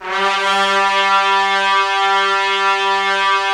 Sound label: Trumpets; Roland - LA Composer vol. 4